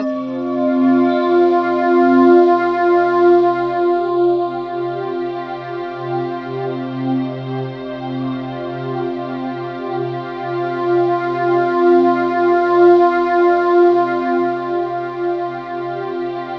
SYN FLANG; Sound & Vision - Gigapack I CD 2

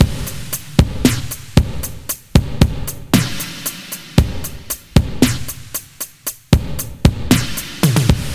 Beat Glossy; Zero-G; Future Wave; Beats

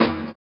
SWUSH; Spectrasonics; Metamorphosis

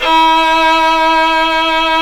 SOL VIO 6, Synclavier Strings; ILIO

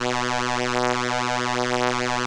ELKA, String Machines; ELKA RHAP

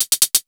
125 HH LP, Big Beat Drumloops; USB Soundscan vol.25; AKAI

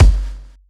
Kik; Rawcutz - Nu Forms

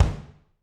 BD, Drums; Bob Clearmountain Drums I

